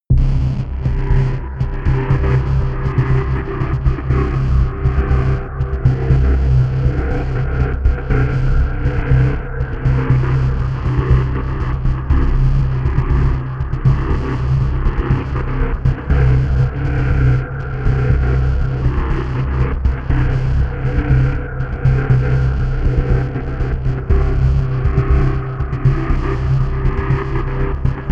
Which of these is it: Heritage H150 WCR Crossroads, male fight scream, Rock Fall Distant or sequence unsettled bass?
sequence unsettled bass